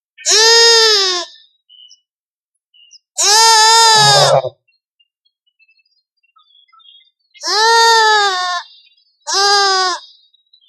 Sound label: Lambs eating; ORION